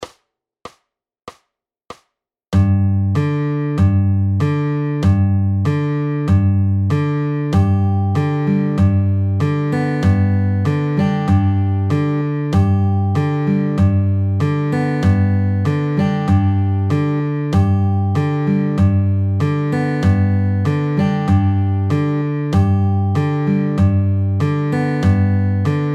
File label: Slow, fingerpicking